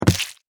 Insect Squish, Insect, Squish, Hit, Windshield, Hard, Splat, Thump, Impact, Bug; Squishes; Animals:Insects; Blastwave